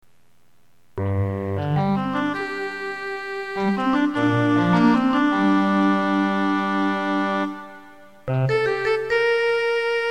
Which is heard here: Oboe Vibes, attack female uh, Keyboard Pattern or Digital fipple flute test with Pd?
Oboe Vibes